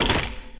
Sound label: movement run; smite; Arachne